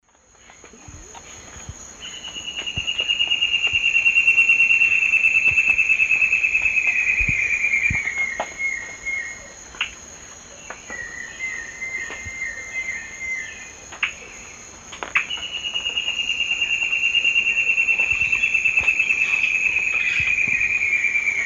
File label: Myrmoborus leucophrys, song; S. America; Eastern Lowlands